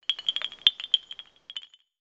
Bamboo windchimes; ninjago

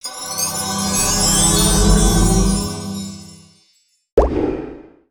Heal; Love

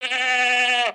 Pet Goat Bah; creatures pets

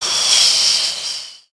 snore out

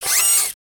Fail Arm Drop; emotes; Overbuild

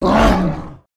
melee; ManEater

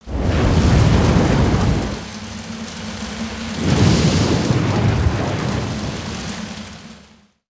Nursery Fire

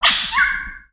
Bird Amazon Oneshots; ambience; gnarled forest

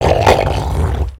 Tentacle Monster Growl; destructibles

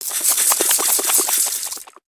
Tiki Torch Shake

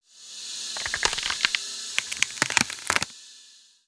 Ice Crackle

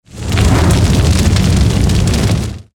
Attack Flame Tail; Dragon In A Box; destructibles

